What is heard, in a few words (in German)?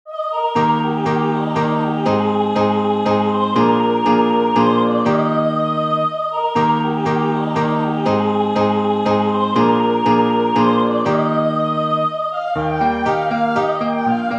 Kuhreihen, alphorns